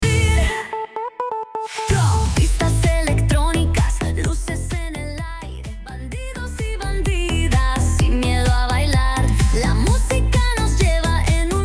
128 BPM

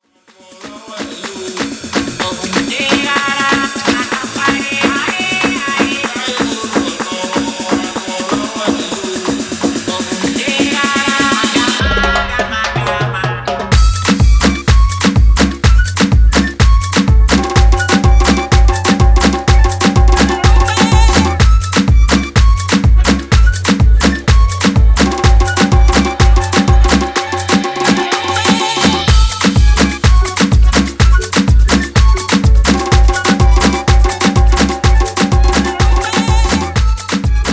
afro remix 125 bpm

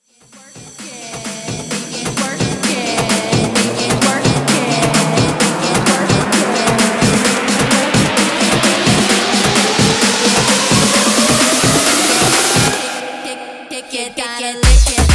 guaracha remix 130 bpm; Reggaeton dj remixes; Prodeejay Remix

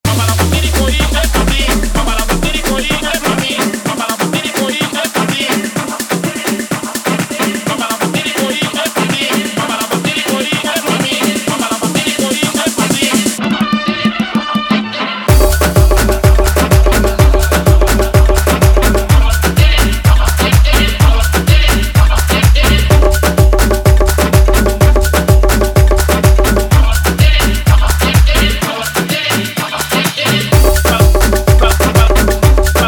afro remix 126 bpm

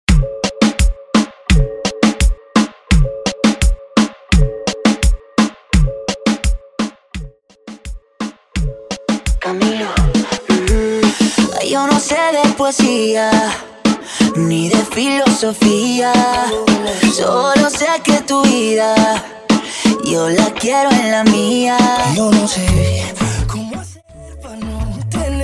Intro Outro Redrums 85 BPM

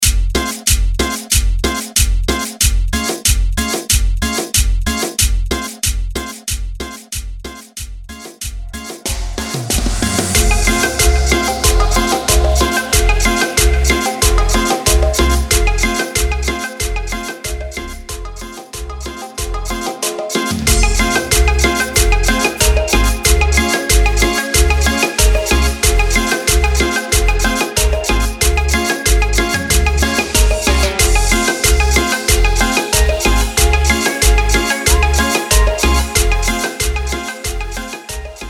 Intro Outro 93 BPM

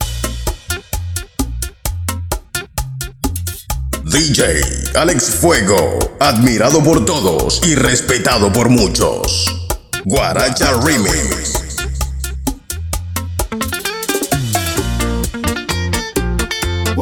130 BPM

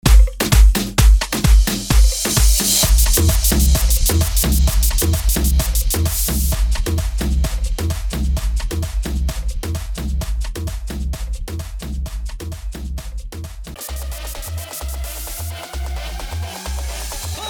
130 bpm

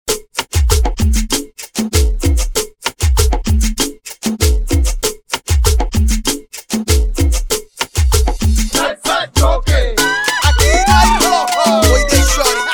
remix bpm 97

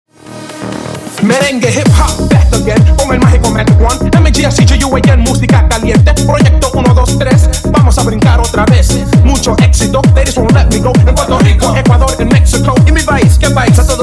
intro outro 132 bpm

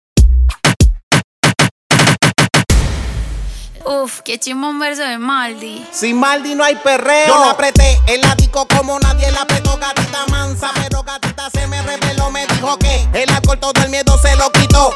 intro acapella 95bpm; Prodeejay Remix

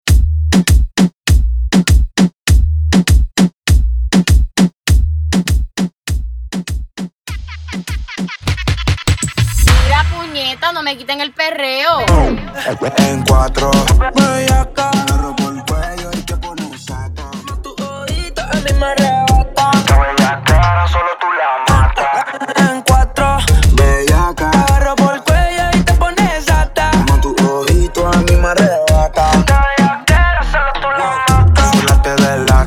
Dirty Extended Break 100 BPM

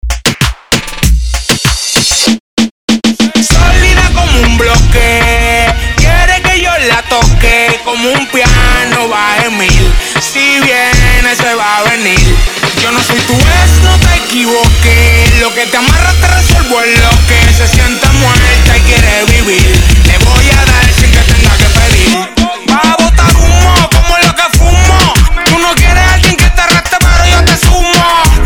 Intro Outro 97 BPM

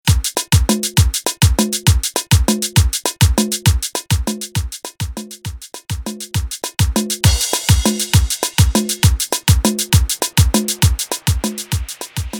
Intro 134 BPM

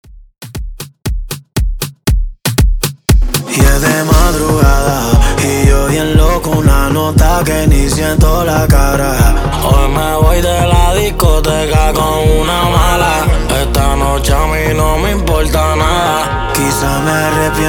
Intro Verso 118Bpm

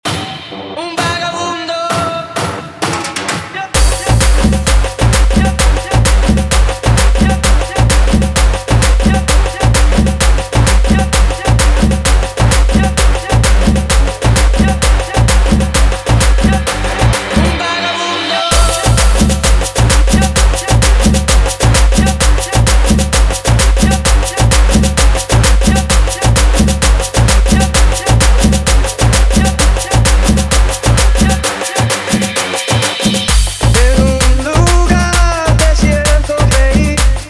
guaracha remix 130 bpm

